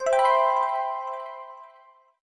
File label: Jingle Ability up